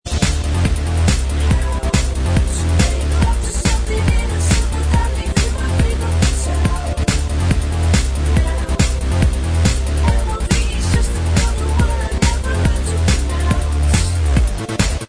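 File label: backing vocals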